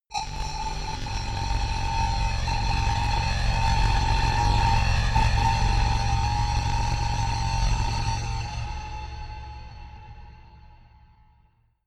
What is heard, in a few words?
danger rumble, horror